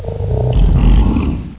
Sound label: gar idle